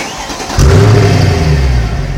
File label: mercedes sound; Mercedes Sound Klingelton